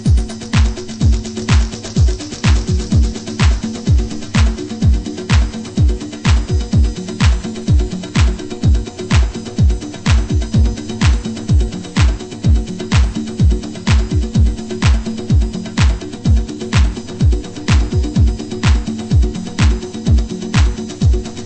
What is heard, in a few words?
drum love, AFRO / TRIBAL HOUSE